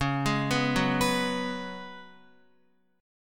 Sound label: Db 7th Suspended 4th Db down Guitar; JGuitar